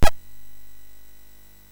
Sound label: sid MOS6581R3; Through the Trap Door